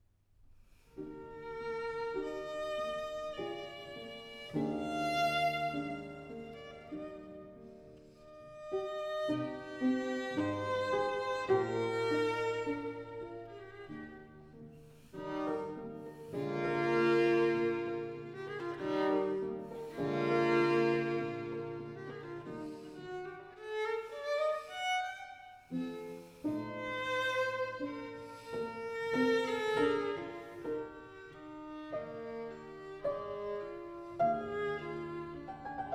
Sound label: Sonata for violin & piano in B flat major, Andante; Mozart